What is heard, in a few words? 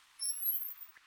Chirp